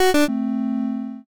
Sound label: eliminate